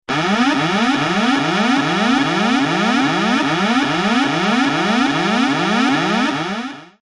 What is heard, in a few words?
Panic Button Alarm Panic Attack